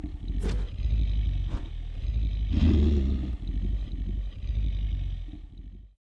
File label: Zombie Idle